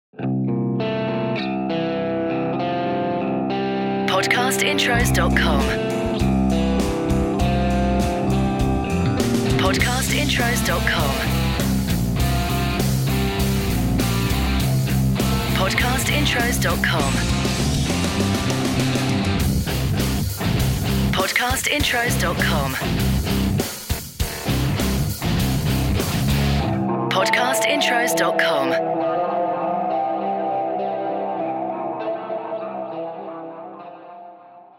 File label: Podcast Watermark ROCK